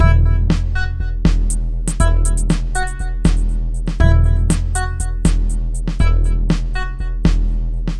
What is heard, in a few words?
Nightwave 120bpm; Ambience